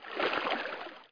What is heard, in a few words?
water walk; Player; Heretic II